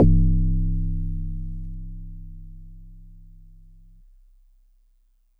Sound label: Marimba DX100 C1